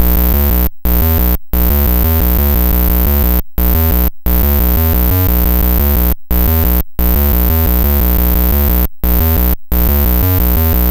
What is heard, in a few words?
Apple II Bass, Chiptune; 8-Bit; Sonic Foundry; Sony Creative Software